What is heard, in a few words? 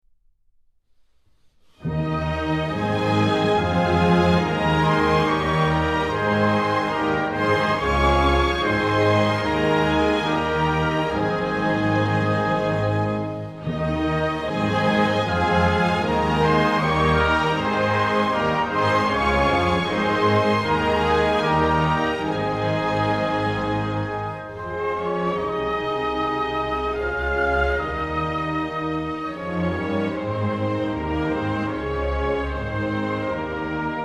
dutch anthem